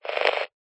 technology radio weak signal buzz static very short burst